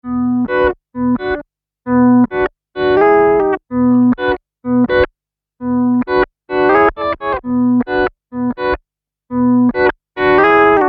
Sub + Git. + lange Attack + Reso; EHX MS

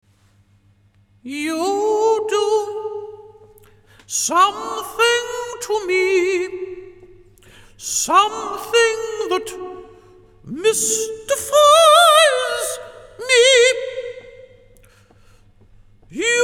LXP-5 Gesang MEDIUM ROOM

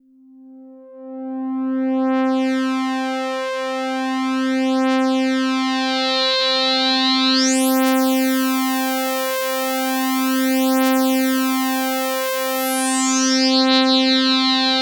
OB-X8 Filter Sweep Curtis 12 dB volle Resonanz

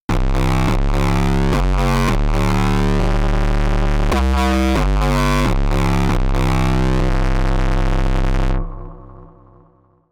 verzerrter dub bass sound patch; syntakt workshop

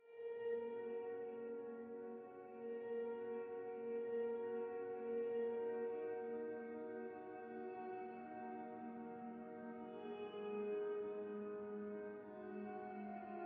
Blackhole iOS Nebular Klavier

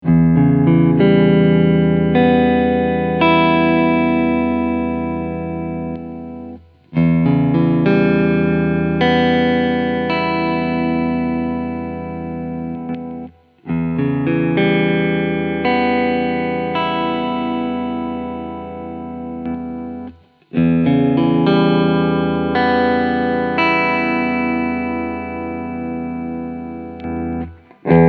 Pickupfahrt Clean

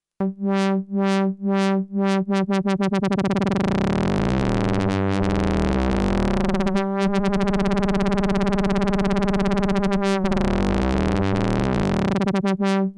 FM ohne und mit Resonanz

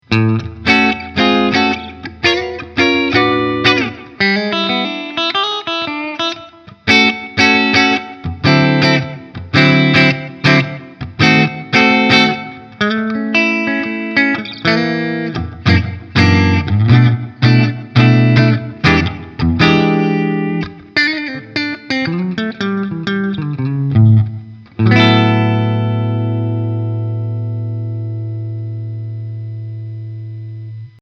Beide Pickups clean

